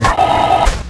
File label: turbo, ships; Quantum Redshift; XBOX; kutoo